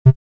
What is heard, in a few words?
Tristar, synth lead